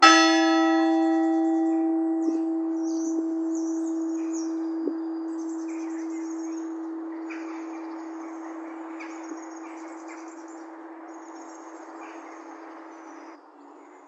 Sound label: three, bells; copthorne